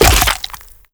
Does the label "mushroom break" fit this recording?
Yes